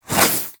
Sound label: Warfan Whoosh, Weapons Melee; Warframe